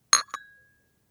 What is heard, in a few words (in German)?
Tasse abstellen